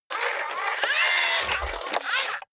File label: robot movement slow long